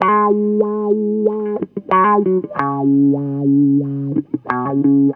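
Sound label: guitar, Roots Funk; USB Soundscan vol.41; AKAI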